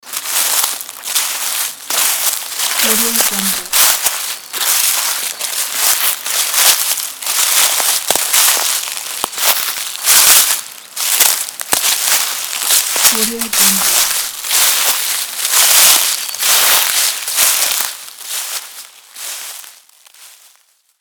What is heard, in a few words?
walking on dry leaves